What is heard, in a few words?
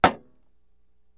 Axe Hits Shield 02 Lig